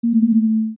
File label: Main Menu Error; Error on the main menu